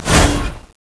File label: razor jump